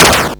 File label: Arcade Riffs